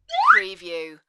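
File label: Slide Whistle Rise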